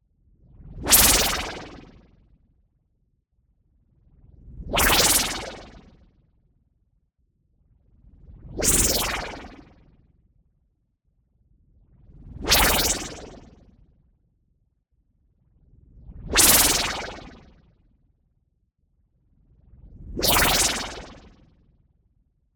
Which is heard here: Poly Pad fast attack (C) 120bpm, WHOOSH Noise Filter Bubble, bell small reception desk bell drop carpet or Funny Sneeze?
WHOOSH Noise Filter Bubble